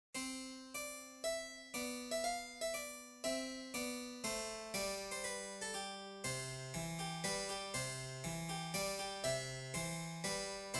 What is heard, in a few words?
Grand March; avison